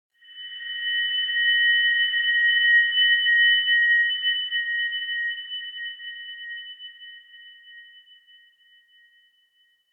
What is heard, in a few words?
sonar Ambience Beacon; Barotrauma; Real Sonar